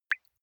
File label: single drops falling